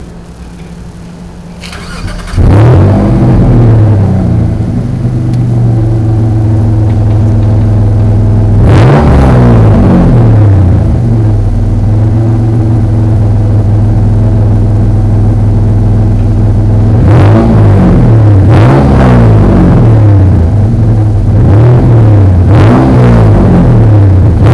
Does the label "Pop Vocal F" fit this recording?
No